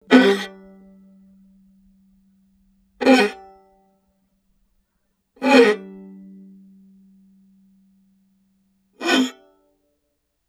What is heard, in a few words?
Violin Extended Technique